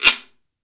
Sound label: GUIRO